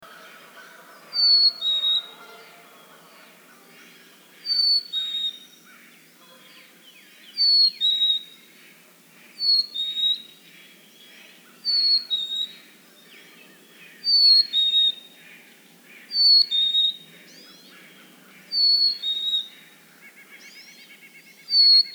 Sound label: Chickadee Song